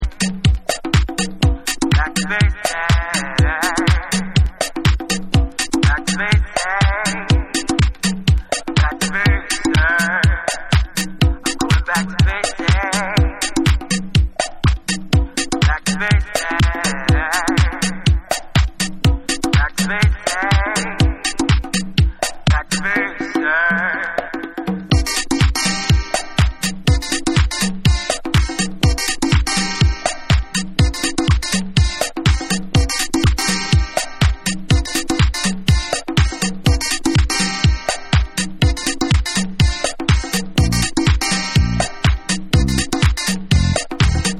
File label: House Mix Dub